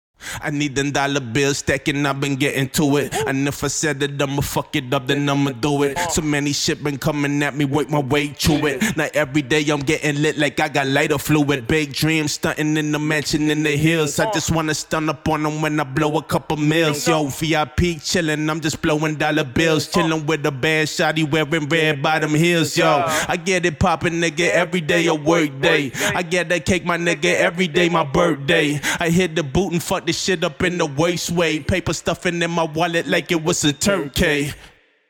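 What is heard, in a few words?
hip hop, 168, E flat minor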